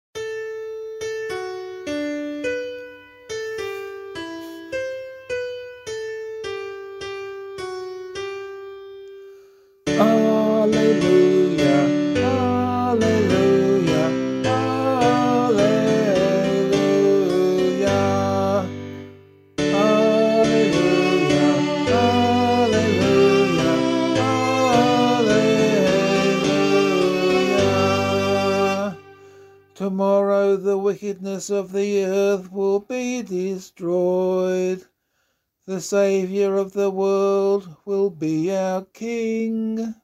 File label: Christmas Vigil Gospel - vocal, Gospel Acclamation; Gospelcclamation for Australian Catholic liturgy